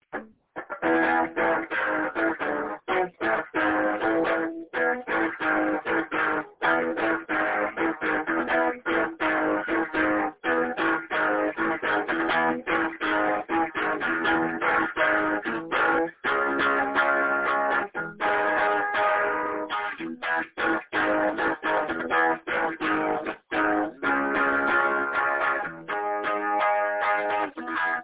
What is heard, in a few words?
trash chords